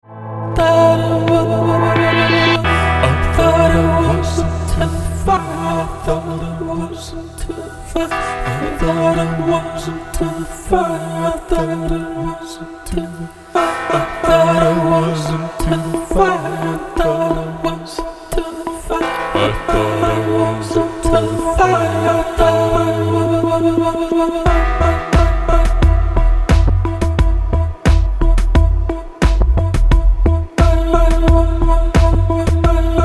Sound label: pop